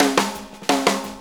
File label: No Small Feat Fill, Drum Tools; Sonic Foundry (Sony Creative Software); Loops & Samples Drum Tools